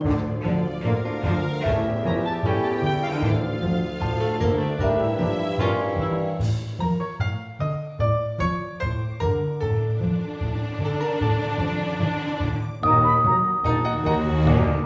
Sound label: Caves; Don't Starve Giant Edition; PS Vita